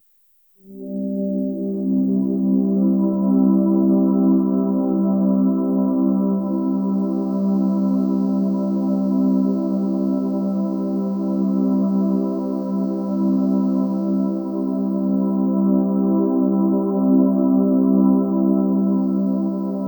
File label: gentle airy ambient pad, meditation